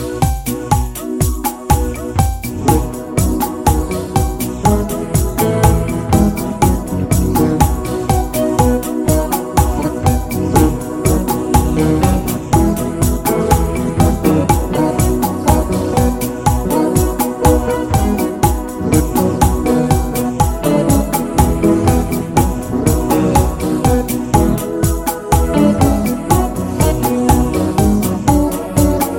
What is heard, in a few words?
Singing and Dancing